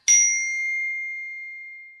bip iphone

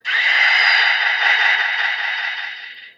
bang; plain palm town

